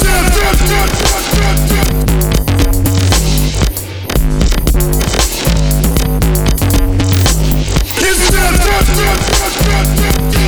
Cut Throat (Instrumental)

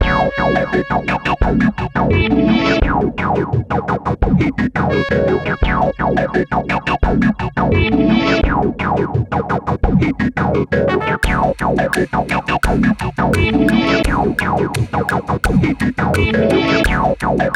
ambient calypso; unbeatable

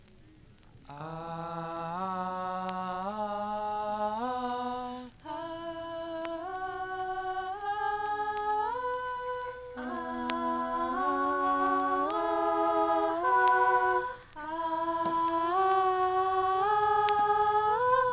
choir

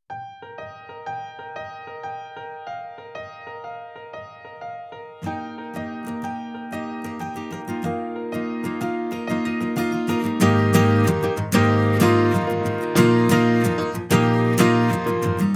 acoustic

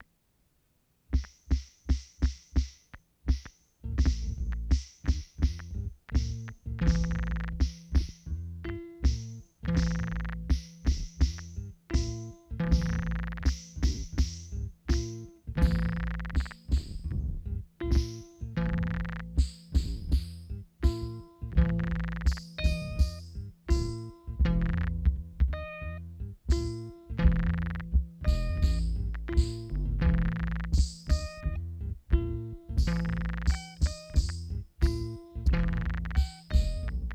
instrumental

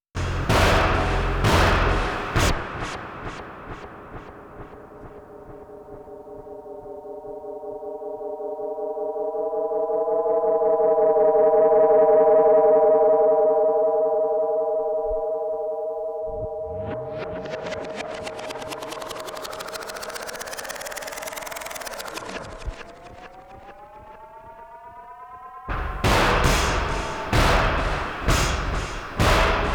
Bass Leftovers

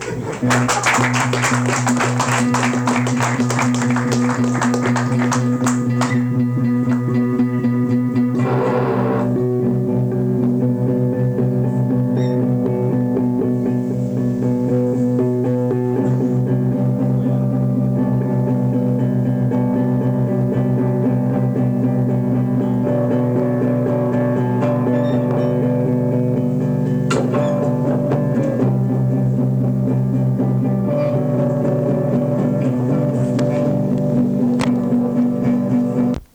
guitar, live; a few live recordings- 1999 - 2003

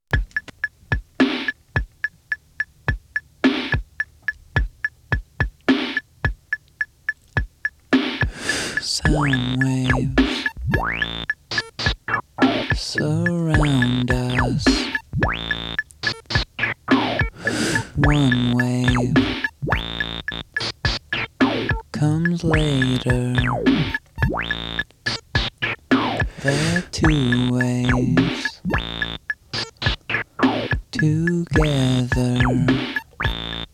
Sound Waves

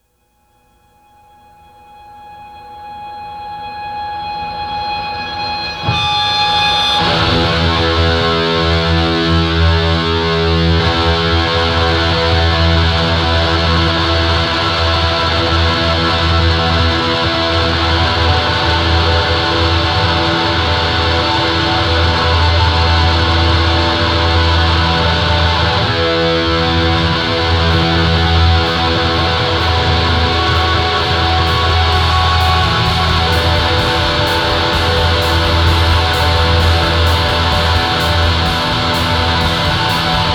instrumental

